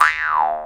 Jaw Harp Weaow; Multisamples; Digital Sound Factory Planet Earth

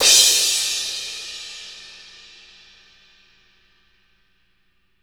DUAL CRASH

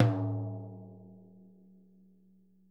ROTOTOMS; Sonic Reality

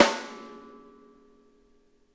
SNARE; Sonic Reality; Interactive Drum Kits

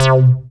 BASS C4; JGL BASS; Jungle; USB Soundscan vol.05; Explosive Jungle; AKAI; 90s sample CD

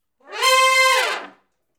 Hi Rip Up & Falloff (C), horn sections; Crimson Blue and Fabulous Horncraft 4 RnB; Horncraft for R&B

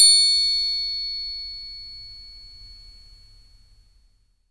TRIANGLE

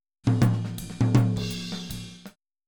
Boogaloo Fill Tom Rack, 82 BPM; Sonic Foundry; Drums from the Big Room The Mixes

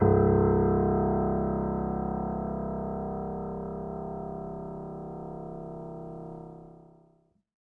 Acoustic Piano

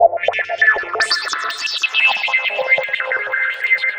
Flutter Synth, Synth Textures; Sonic Foundry; The Electronic Music Manuscript Collection

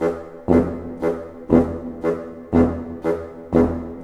SNAR, Snare Army; East West - Scoring Tools

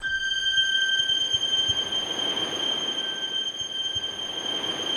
BELL PAD G5, Yamaha VP-1

